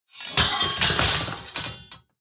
Glaive Underlay Death

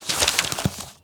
Parchment Book Open Attack Underlay

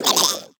Vicious Scalehide Death

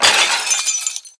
Steward Death Underlay

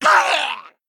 Male Murloc Death

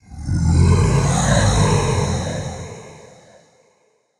Twilight Elemental, Play

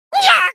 Baby Murloc Attack

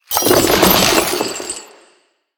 Wishing Well Attack

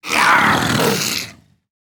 Gold Tusk Cub Attack

